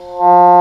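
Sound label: English Horn p F2; Digital Sound Factory Virtuoso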